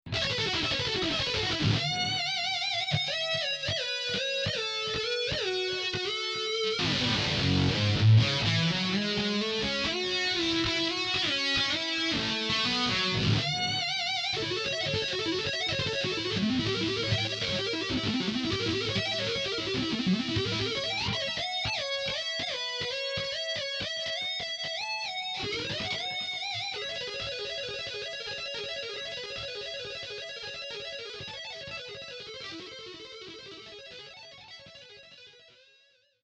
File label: lead shred; VOX AD15-30